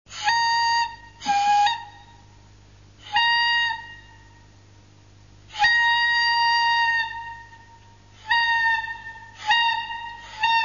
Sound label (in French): trompette; bruit de trompette